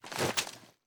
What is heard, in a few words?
ar reload empty twist, weapons; viper